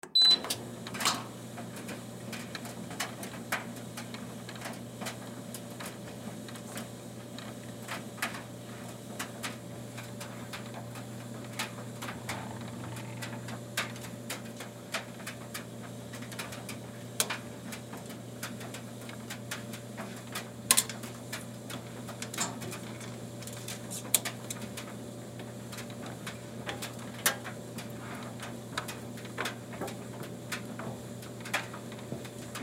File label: Dryer Turn On Beep, Dryer Turn On Beep Run Shut Off; Frigidaire Appliance; Household; Blastwave